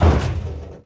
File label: Aircrafts Gear Rev; DCS